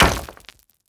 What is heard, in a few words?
concrete; Rust